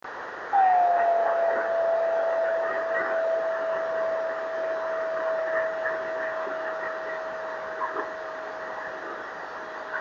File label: 26860KHz